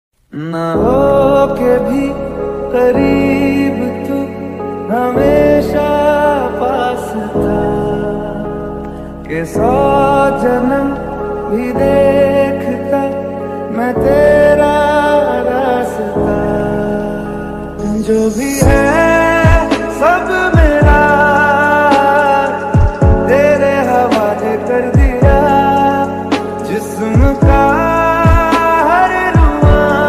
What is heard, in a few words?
song ringtone love ringtone